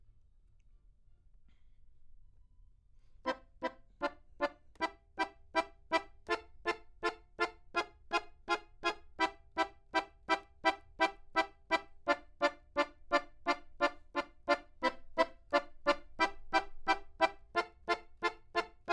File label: Accordion